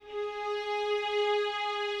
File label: strings